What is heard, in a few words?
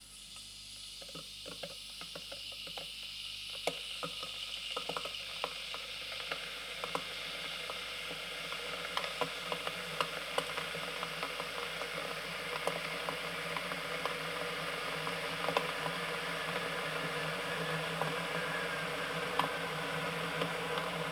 Kettle boiling; Sound effects; Foley